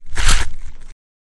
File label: off limb chopping